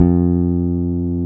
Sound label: BASSES, Roland